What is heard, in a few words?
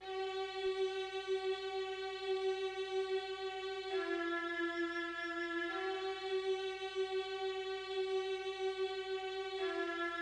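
strings 85 B; Never Doubt Me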